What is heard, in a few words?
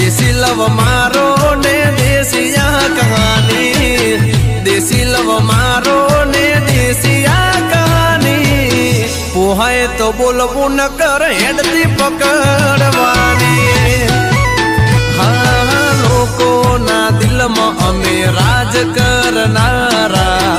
New Gujarati Song Ringtone